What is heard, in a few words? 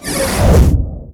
Frost Arrow